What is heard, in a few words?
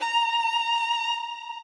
solo